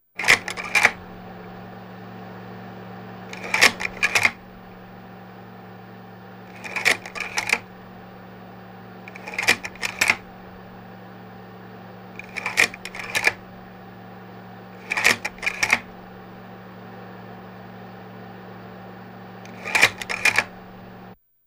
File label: Slide Projector Fan and Slide Change